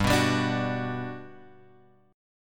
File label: G Major 7th, G, down, Guitar, Open G